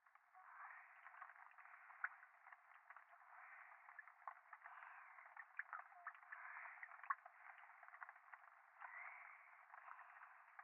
右耳密着吐息 雨上がり

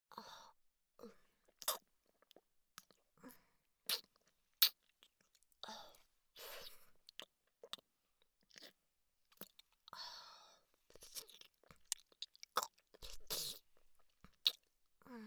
フェラチオ; SEなし

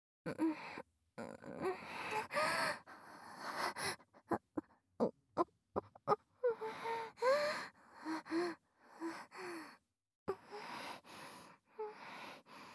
挿入 喘ぎ; SEなし

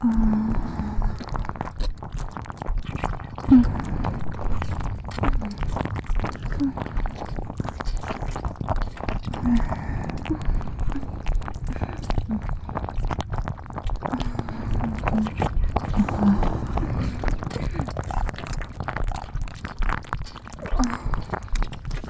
両耳舐め, ASMR; フェラチオ搾精

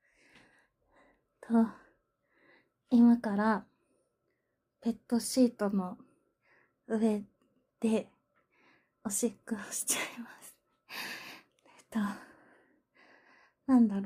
ペット シーツ に わんわん スタイル 犬 の オシッコ ポーズ で オシッコ です

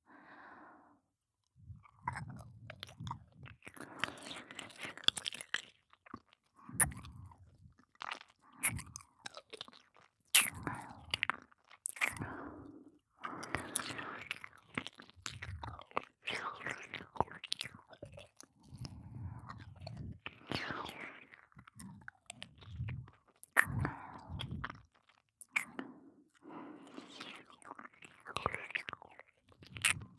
右接近・耳舐め・普通; ミーシャ

